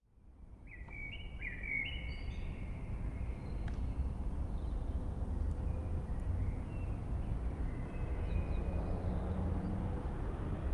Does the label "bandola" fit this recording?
No